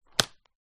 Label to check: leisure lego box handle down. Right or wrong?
Right